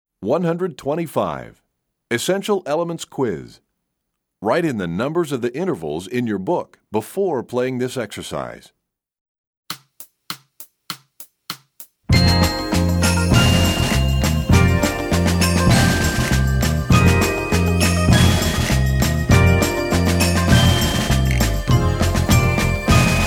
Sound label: Percussion; EE Quiz; EE2000 Book1